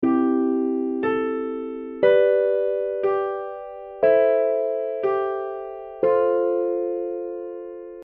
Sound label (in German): melodie